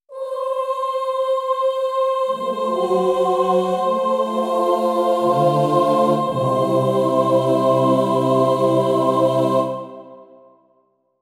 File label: yamaha tyros5 ensemble open choral